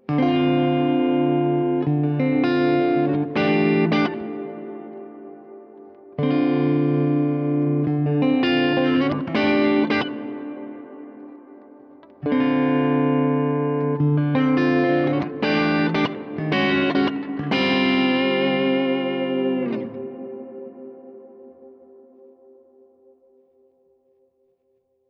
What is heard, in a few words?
Reverb Hall